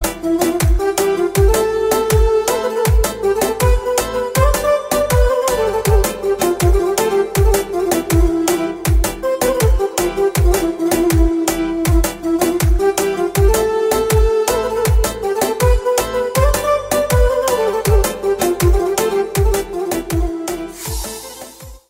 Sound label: Arabic Style Pop